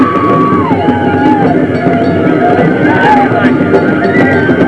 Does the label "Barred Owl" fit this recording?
No